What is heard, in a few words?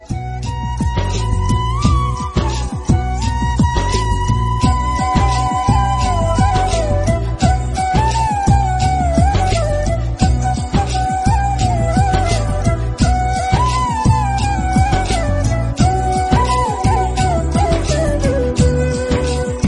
tamil songs bgm; jodi